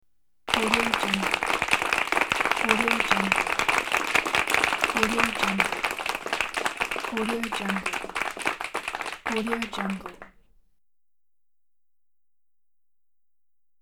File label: clapping